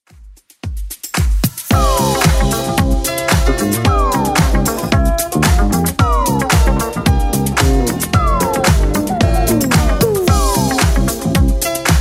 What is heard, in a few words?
70s Club 112 bpm